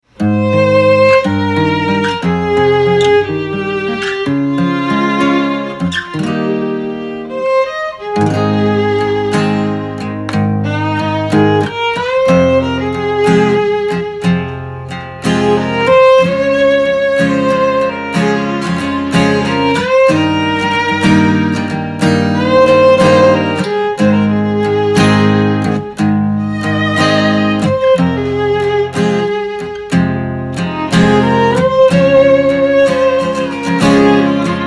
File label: Violin and Guitar